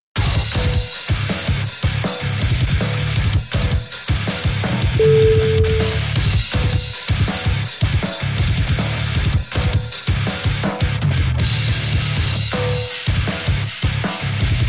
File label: rock war